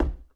wood step; Golden Crayon Sounds

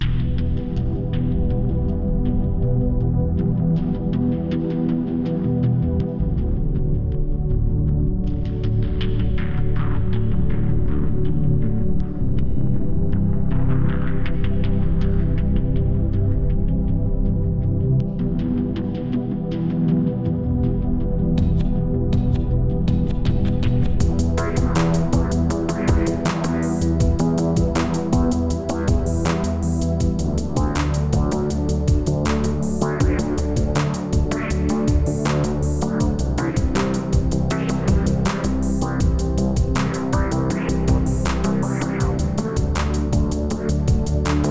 thunder prairie; Manamon Music; Manamon 2

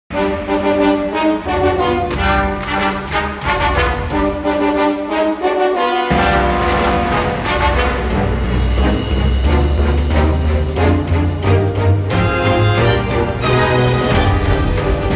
death panic; Manamon Music; Manamon 2

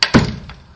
door close; Golden Crayon Sounds

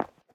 concrete step; Golden Crayon Sounds

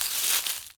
leaves step; Golden Crayon Sounds

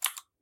keyboard type; Golden Crayon Sounds

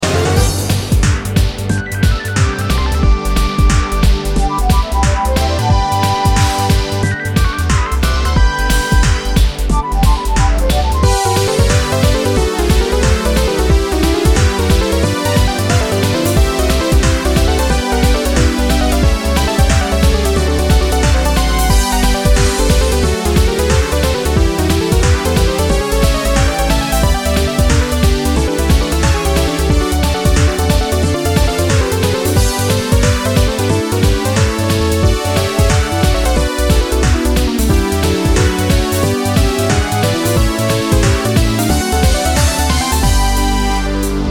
battle victory; Manamon Music; Manamon 2; tamer

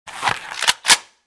sniper draw; Original TK Sounds

